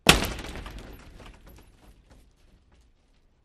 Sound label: Hockey Hit Heavy